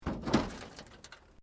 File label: Metal Light Movement Minor